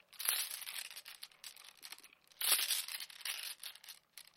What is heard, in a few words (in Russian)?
sbor busin ot rassipavshihsya bus